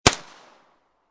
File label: MP5 9mm Barrel; Machine Gun; Machine Guns; VRSonic